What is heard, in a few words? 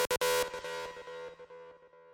Critical Stop